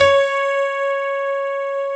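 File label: grand piano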